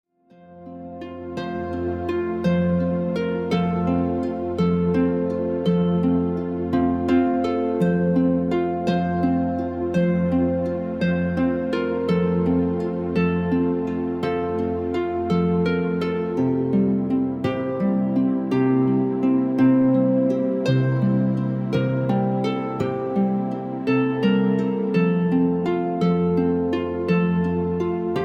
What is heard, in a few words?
Baby Lullaby